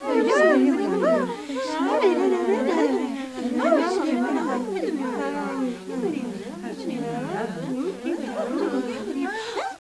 Crowd; Princess and the Pea; SoftKey

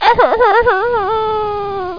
cry; Geschicklichkeit; Mean Cuisine

